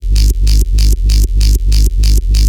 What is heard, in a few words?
TEK BASS